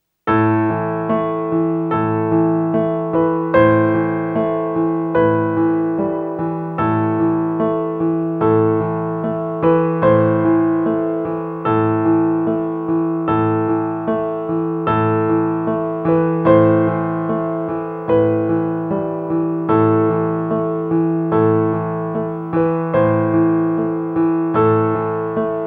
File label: solo piano